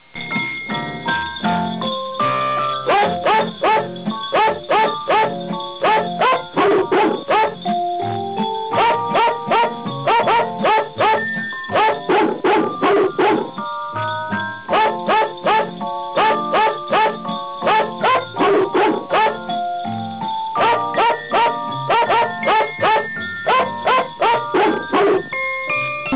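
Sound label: dog singing jingle bells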